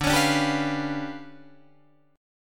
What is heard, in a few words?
D Minor Major 13th, down, Guitar, DADGAD